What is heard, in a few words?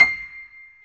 PIA PRO, Roland